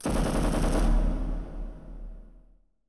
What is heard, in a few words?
dist gun burst